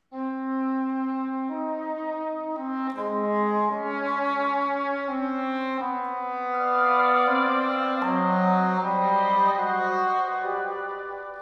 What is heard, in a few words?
Variations in C major for 2 oboes & english horn; Ludwig van Beethoven - Complete Works [Brilliant Classics 100 CD Box]; Music for Wind Ensemble II; on Mozart's ''La ci darem la mano'' WoO28